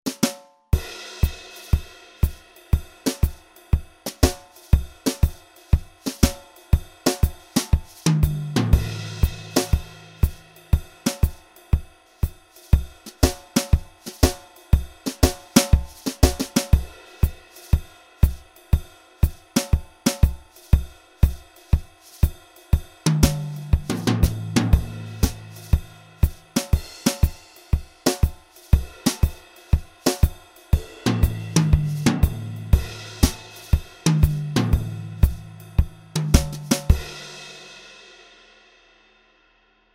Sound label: jazz drums only